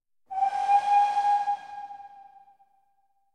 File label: Train Whistle Distant